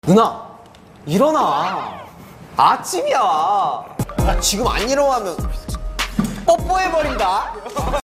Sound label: Alarm